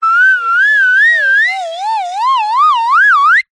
cartoon slide whistle ascend climb wobble

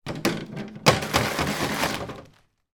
bag of frozen vegetables take out of freezer; food

